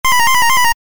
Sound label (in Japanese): グルグル レトロ モノラル